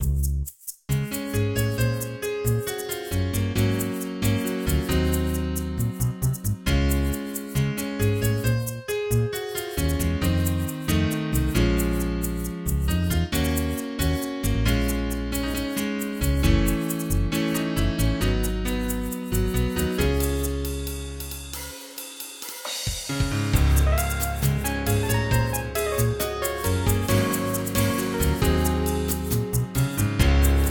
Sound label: jingle